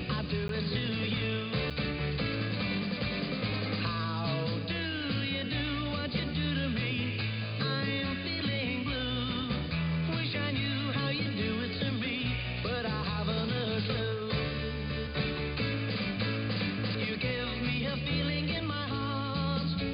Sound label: kiwisdr audio